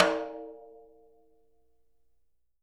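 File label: jazz snares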